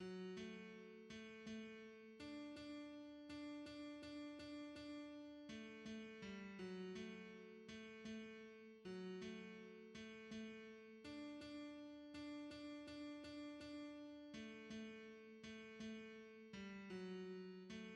tenor, hymns; Louange a Toi Dieu notre Pere

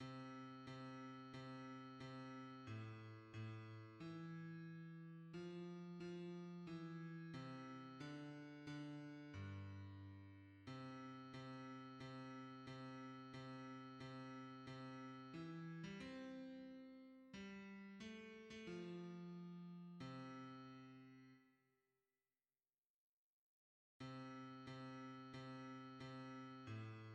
hymns, bass; Man of Sorrows what a name

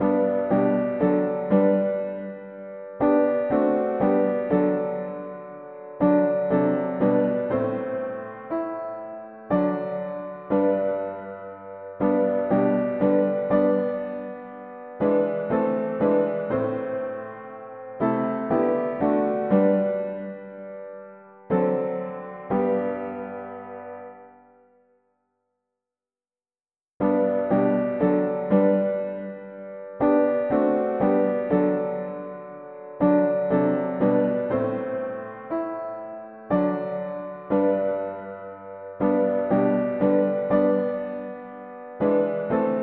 mono piano, hymns; O gracious Father God of love

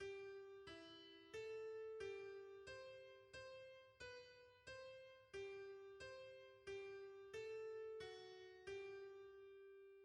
soprano; hymns; Now may the God of peace and love

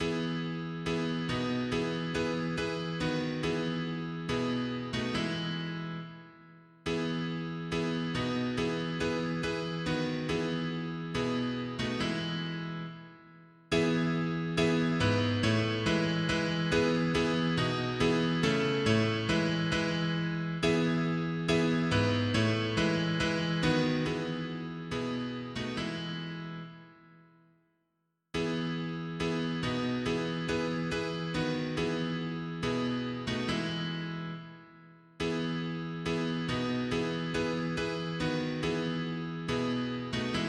mono piano, hymns; Le Seigneur nous appelle

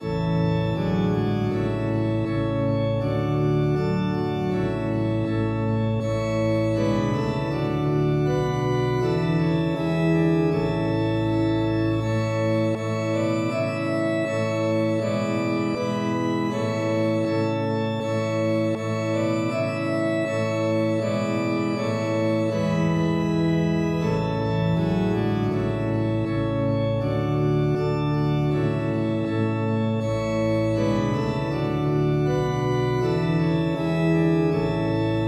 polyinstru; hymns

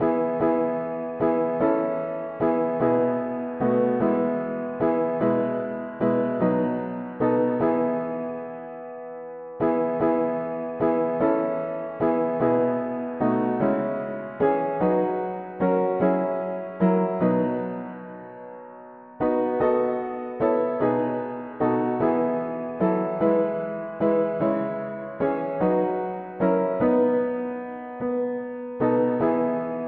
mono-piano, hymns; To Him that loved us gave Himself

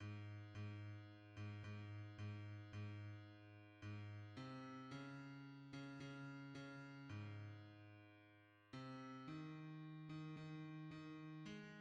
bass; Dans une marche sainte; hymns

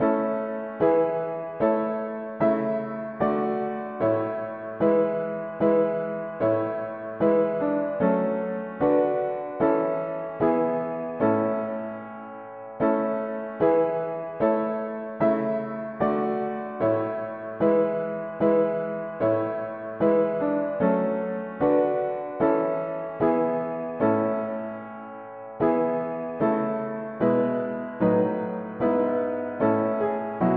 mono piano; Stricken smitten and afflicted; hymns

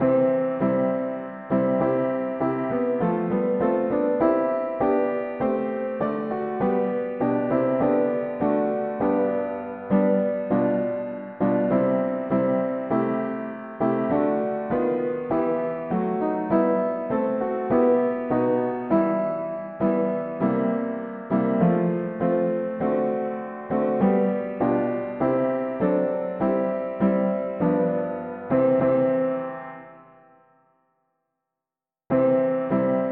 mono piano, hymns; Lord what is man Tis He who died

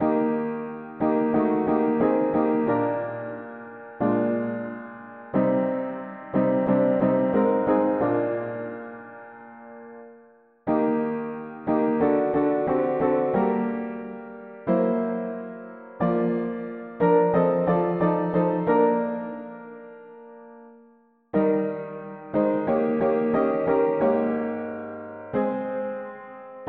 mono-piano, hymns; Lord we love to trace Thy footprints

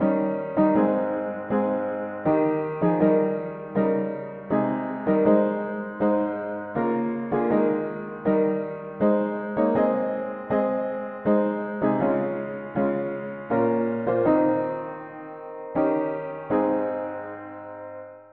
mono piano; Praise the Saviour ye who know Him; hymns

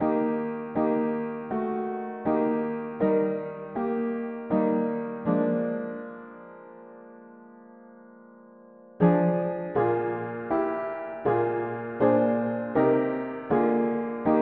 mono piano; hymns

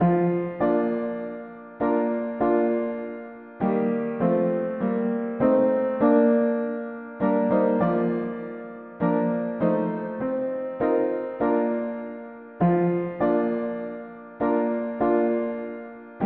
mono piano; hymns

